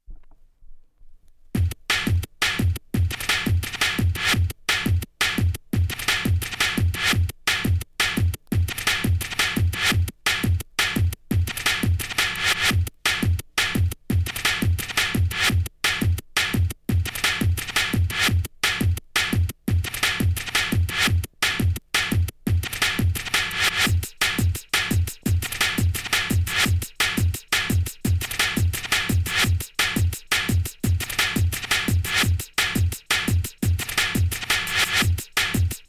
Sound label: TRAP; mixes